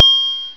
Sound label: type chime